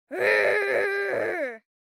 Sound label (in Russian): zlost